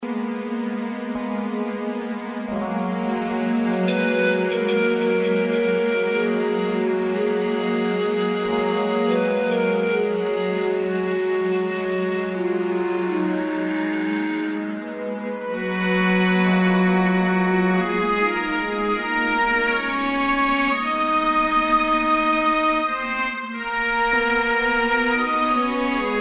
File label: rain